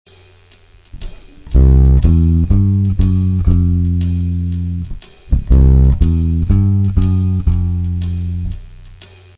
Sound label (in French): quarte, walking bass intervalle quarte